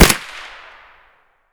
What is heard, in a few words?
vpo209 fire close silenced; Escape from Tarkov